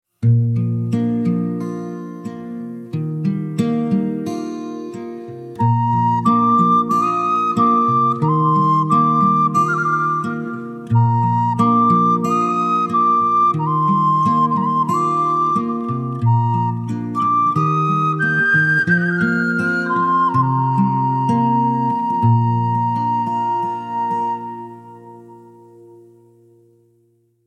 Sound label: flowing celtic meadow